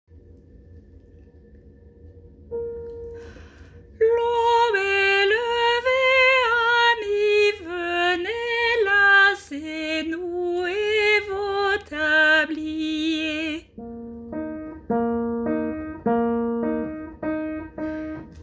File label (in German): Laube soprano; chants